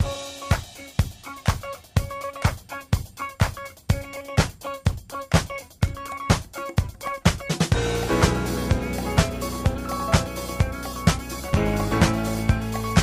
Music Free Spins Loop

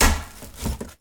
Fight Sequence Wood; Wu Xing; genesis

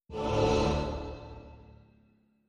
revive; Skillzgaming; battle Mania Desktop

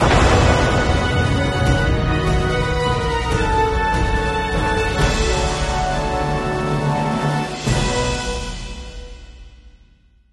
fanfare; Dragons Myth

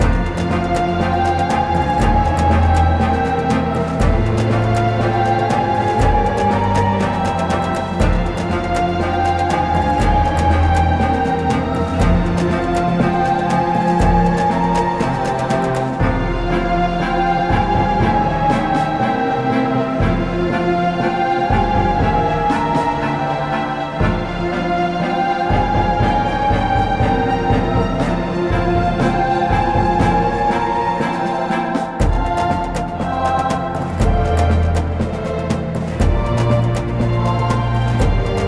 Bonus Loop; genesis; clash Of Queens

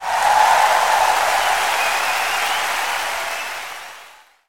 win huge; Skillzgaming; mega Money Rush Desktop

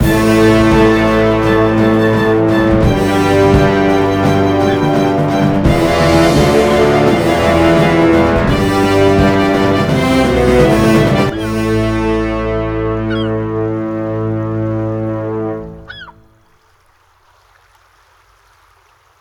Bonus Loop Outro; genesis; jasons Quest Desktop

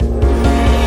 win big win buildup; hades River Of Souls; Fantasma

